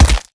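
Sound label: Stalker Movement Synced Melee Facepunch Punch; Titanfall 2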